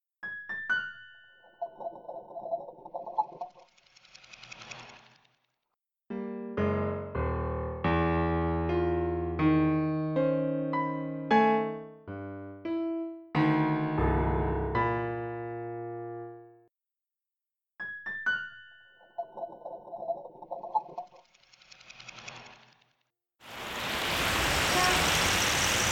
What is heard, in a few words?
Coming Home soundscape